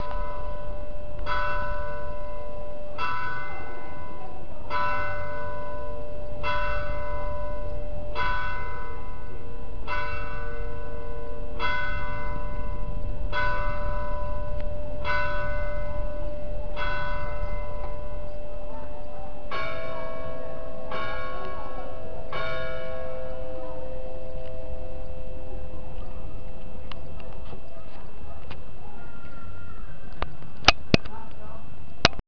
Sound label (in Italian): Campane